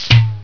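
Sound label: shield off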